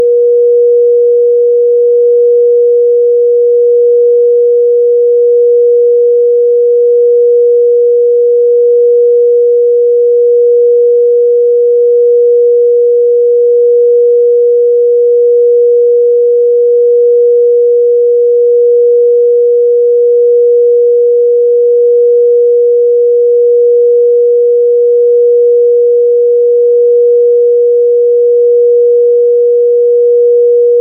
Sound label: header attention sequence